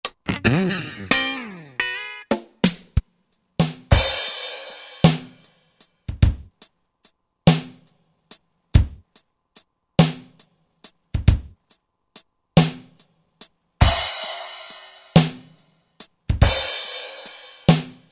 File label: CountrySet; Roland SR-JV80-17 Country Collection